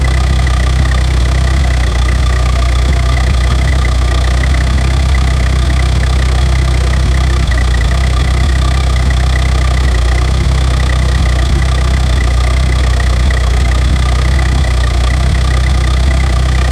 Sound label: low rpm idle v16 engine